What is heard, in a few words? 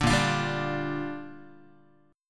A sharp 6th, Guitar; strummed; JGuitar